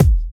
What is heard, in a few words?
Kick, Kickdrum; Equipped Music - Loungin House